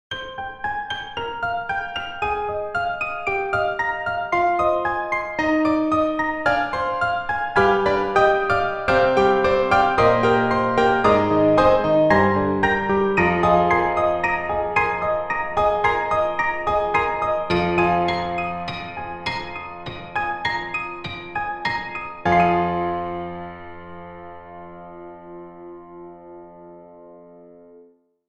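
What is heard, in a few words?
Broken Piano; PianoTears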